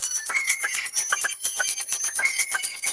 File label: funny